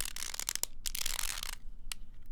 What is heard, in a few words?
Small Cube - Squeeze, Rubik's Cube